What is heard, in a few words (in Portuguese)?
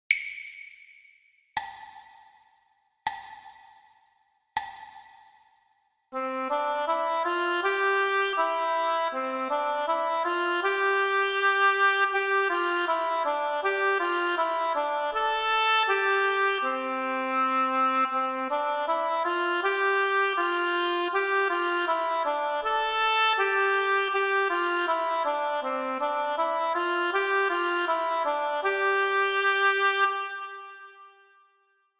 percepcao ditado